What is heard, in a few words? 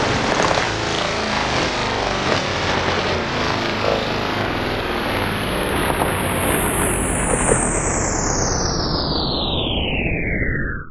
Downsweeps